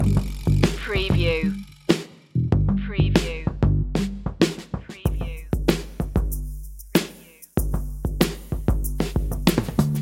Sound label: feel good island loop